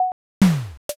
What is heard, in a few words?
vending